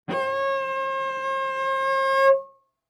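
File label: Cello arco fortissimo sul D C5; MIS Pitches - 2014; Strings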